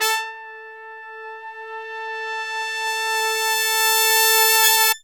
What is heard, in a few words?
BRASS SFZ; Zero-G; Phantom Horns